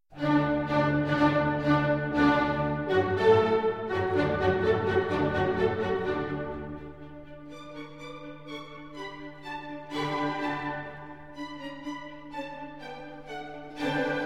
Concerto No. 4 in D Major for Violin and Orchestra